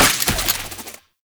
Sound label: Shotgun Glaive Return Mech